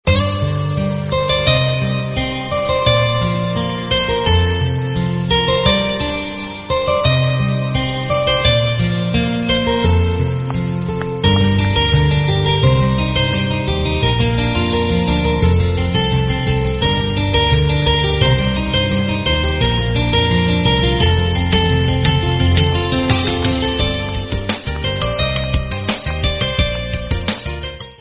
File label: lovely refreshing romantic guitar musical tone ringtone